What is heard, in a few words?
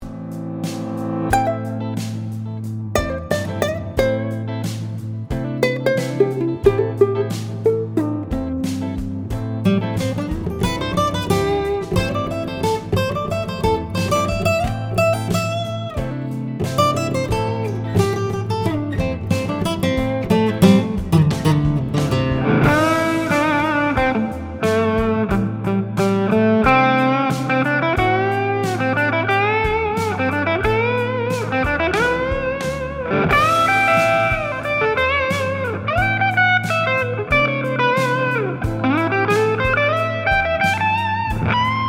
Am solo, guitar